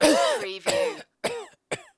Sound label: Coughing, Male Cough